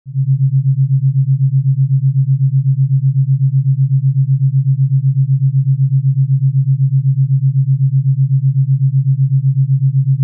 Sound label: Binaural Beats - Relaxation Brain Entrainment; Sleepora